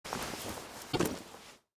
Clothes and Books; Blastwave FX

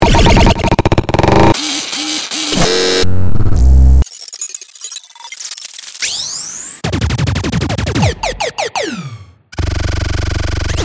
Sci-Fi Foley FX; Field and Foley

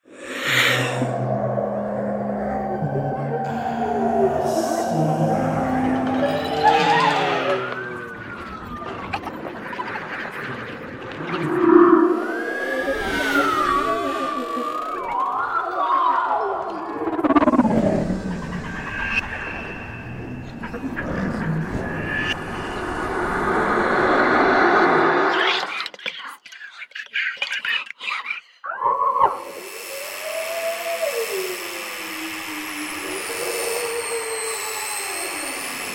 Ghosts; Articulated Sounds

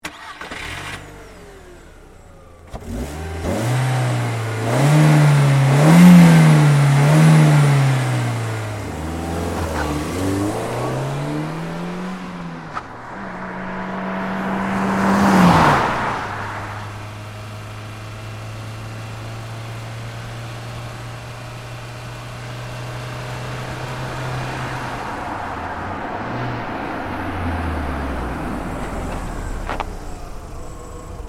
Volkswagen Golf; Big Room Sound; 2003

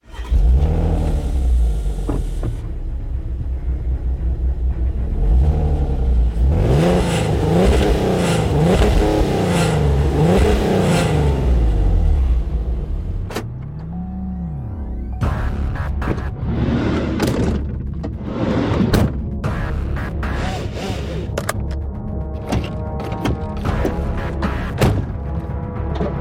CARS SUVs Vans; BOOM Library

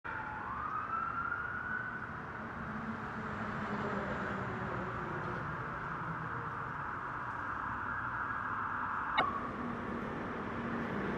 Rush Hour; Big Room Sound

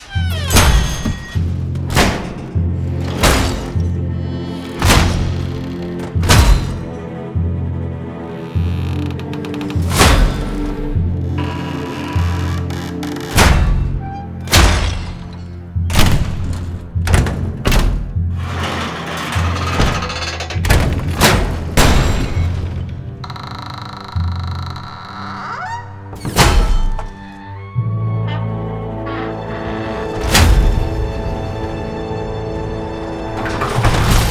Door Slams; SFXtools

